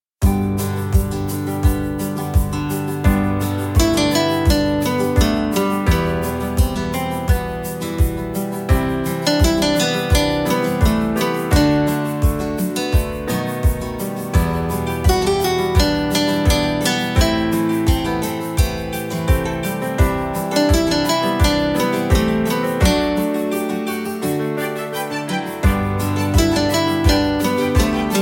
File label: Acoustic Sentimental; MIUI12